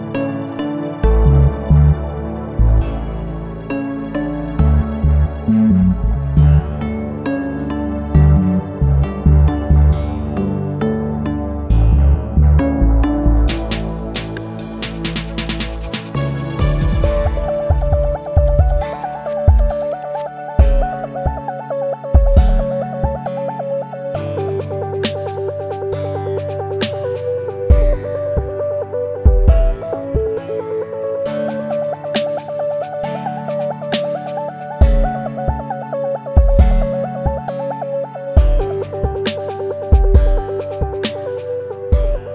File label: peaceful